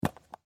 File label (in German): Tupperbox